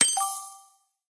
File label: hit; BrickOut